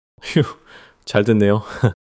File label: laughter